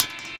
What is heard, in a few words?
Metallic Charred, One Shots, Drums, Misc Percussion